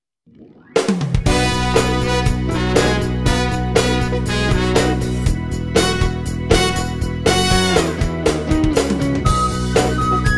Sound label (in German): blockflötentaxi